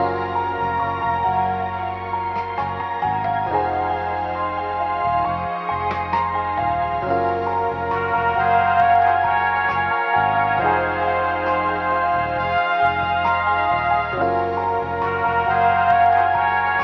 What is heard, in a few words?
keys and horns, Phonky loops